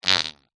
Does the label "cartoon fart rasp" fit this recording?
Yes